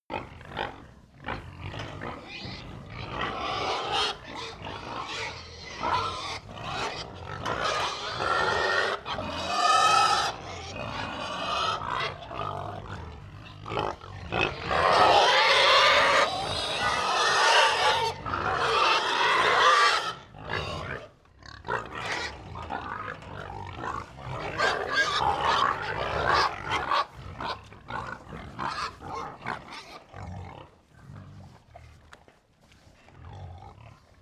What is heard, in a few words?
pig herd grunt, pig